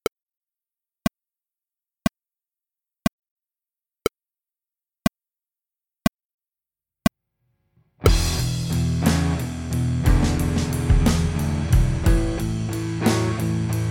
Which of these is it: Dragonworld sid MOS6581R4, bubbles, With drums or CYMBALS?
With drums